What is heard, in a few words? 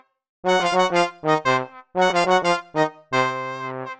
rnb section, brass; arturia